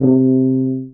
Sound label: Contrabass Tuba Straight C2; DirectWave; Varazdin Orchestral